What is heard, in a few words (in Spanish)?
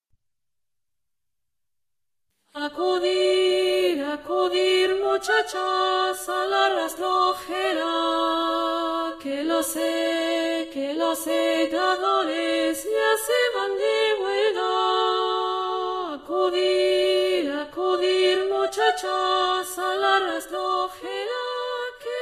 4 voces; espigadoras